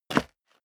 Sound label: step leather shoes concrete grit single stair down; schritte